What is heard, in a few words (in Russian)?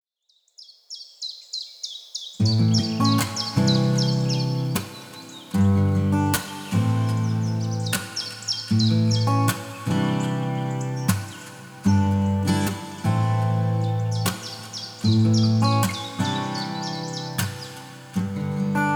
Гитара Классичесская Гитара и звуки Природы